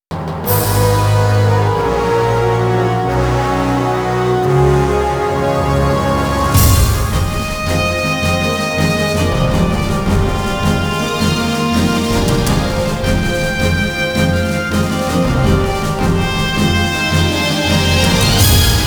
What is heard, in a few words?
Short Jingle; Soulcalibur; Dreamcast